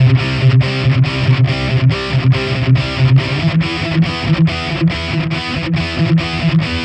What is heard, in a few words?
Distorted Guitar 140BPM Emin; Distorted Guitars; Vengeance - Essential Clubsounds Vol.4; Guitarloops